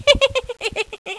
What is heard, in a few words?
hehehe